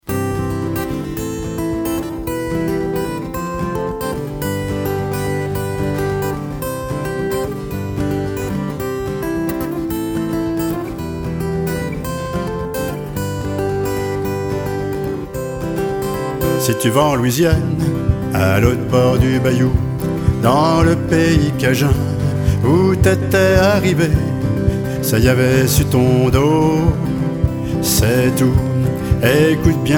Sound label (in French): Ambiance cajun